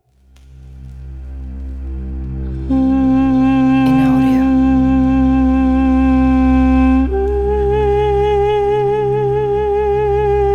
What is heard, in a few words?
Action Oriental Middle Eas